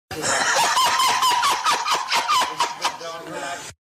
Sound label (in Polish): smiech